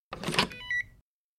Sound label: Swipe Key Card Alarm; hi-tech-various